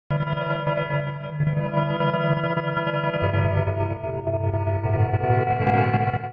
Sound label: 150 bpm, percussion; MIDI Clips; Tonal; Percussion & FX; No Organ; Skitter and Step; Ableton Factory Packs